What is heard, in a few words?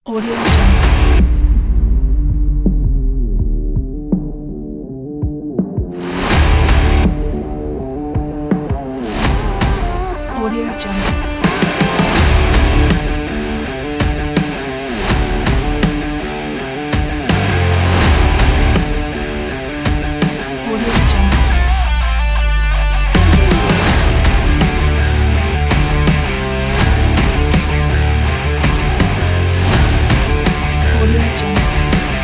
rock trailer